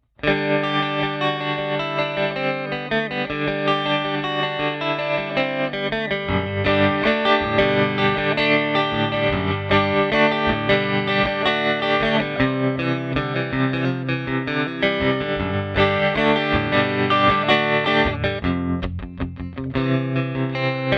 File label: trem